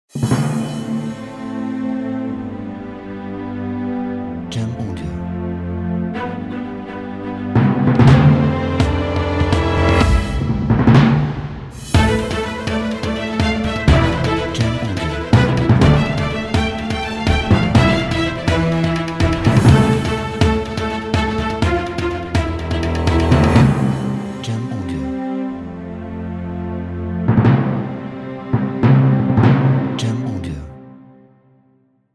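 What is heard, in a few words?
Action Trailer Orchestral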